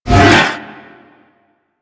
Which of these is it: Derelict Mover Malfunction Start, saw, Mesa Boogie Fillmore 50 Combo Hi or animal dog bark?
Derelict Mover Malfunction Start